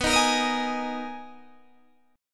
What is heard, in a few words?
B Major 13th B down Guitar DADGAD